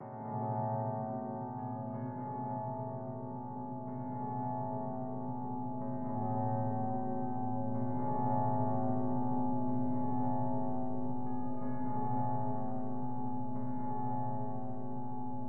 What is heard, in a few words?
dark contemplative pad; Pads